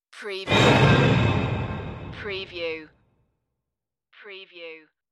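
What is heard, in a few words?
Orchestra Horn Sting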